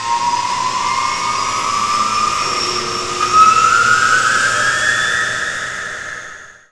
space ship undock; jenquai; Earth and Beyond; EA Games

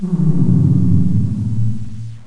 fog; mordor

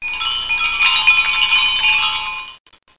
chime; tclock

